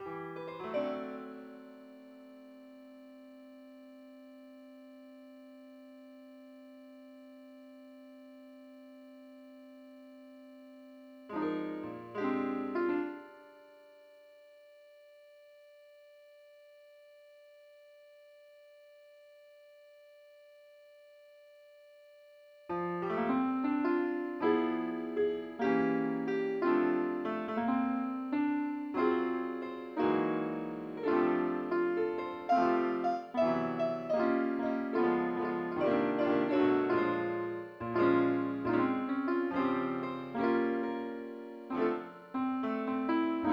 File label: piano